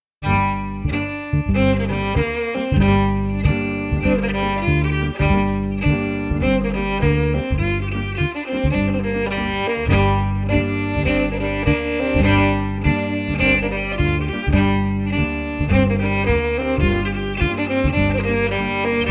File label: FIDDLE PIANO; Jink & Diddle Book o' Tunes 2022